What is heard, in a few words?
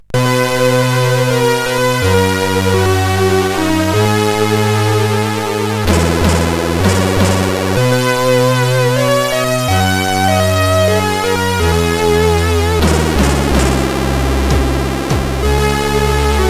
Intro, sid CSG8580R5; Austrian Cracking Crew Intro